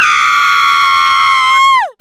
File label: wilhelm